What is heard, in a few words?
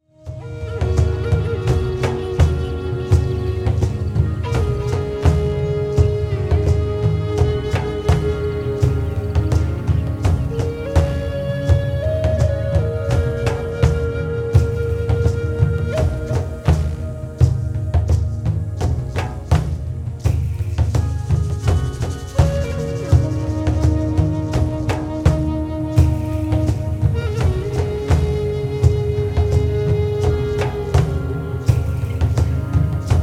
Drum